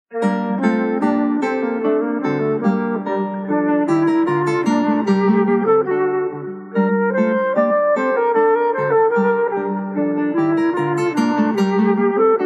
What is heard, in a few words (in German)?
gavotte, querfloete; charles dieupart